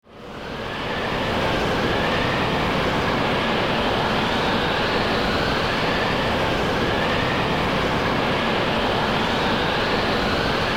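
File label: ambience hitchhike; Wario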